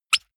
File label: squeaker squeeze hard short